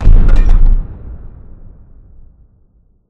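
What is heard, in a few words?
explosion distant metal; corporate; Titanfall 2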